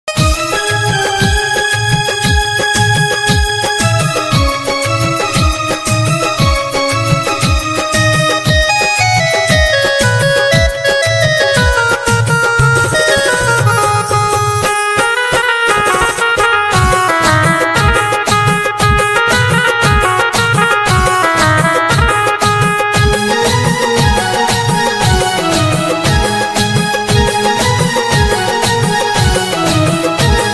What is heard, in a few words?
piano ringtone hindi ringtone